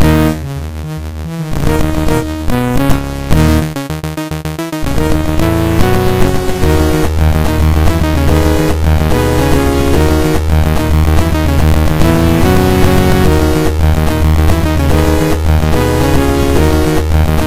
C64 Boss Battle

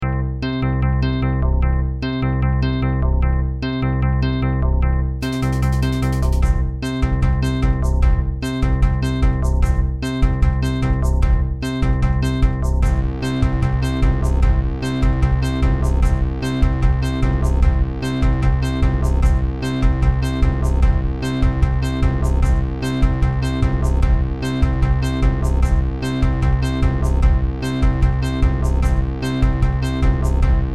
cool platformer theme

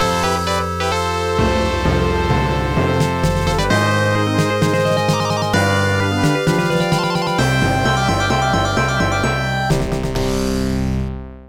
potions outro; stocked up on potions

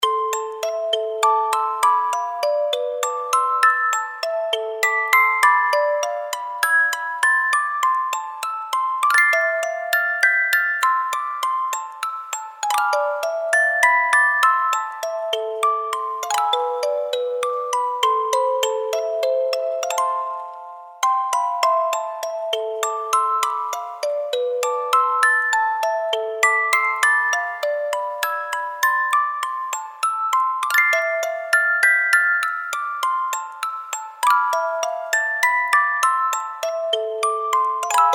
Music Box

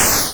atari boom

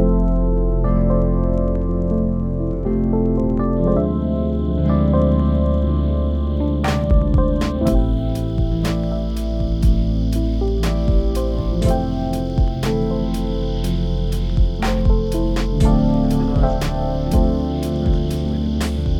lofi

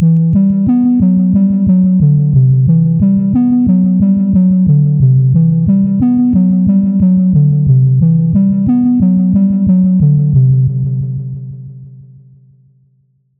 eerie

